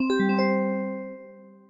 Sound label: completed; fruit master